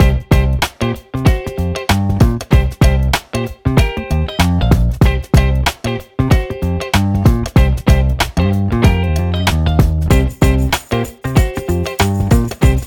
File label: bg music